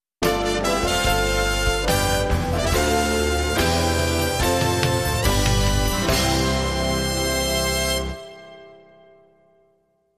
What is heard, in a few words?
Counterpoint